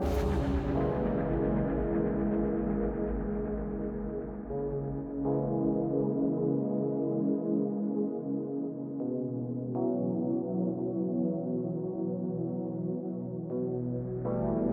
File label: Mix Stem Layer